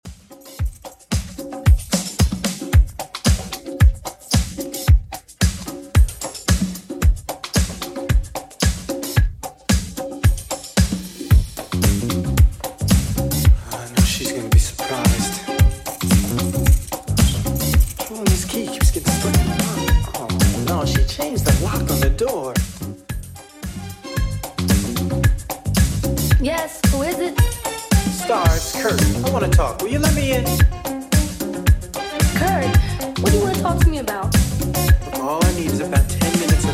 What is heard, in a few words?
80s Redrum 112bpm